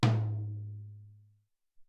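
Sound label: Large Tom, Alesis SR-18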